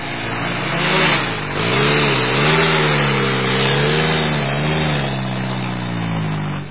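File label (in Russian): фура пытается совершить обгон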